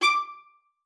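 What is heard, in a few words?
D5, viola spiccato; Xsample VOL-5; 90 s Sample CDs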